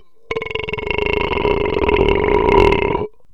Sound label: the barking beer, burps